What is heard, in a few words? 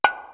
TAMBORIM 1; East West; Global Sounds